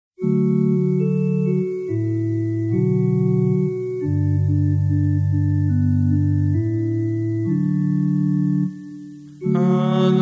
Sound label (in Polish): Adwent alleluja